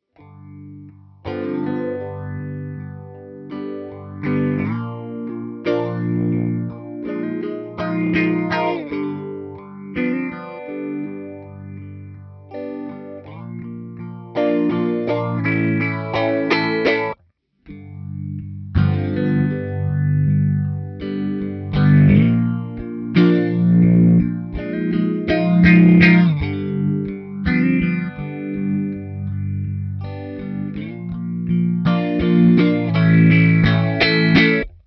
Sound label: phaser; Pha90; true rock tone